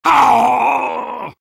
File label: soldier pain severe; vo